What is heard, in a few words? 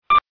notify